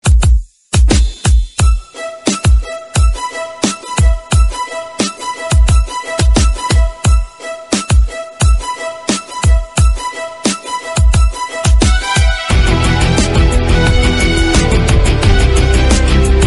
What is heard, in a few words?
attitude remix; free fire; free copyright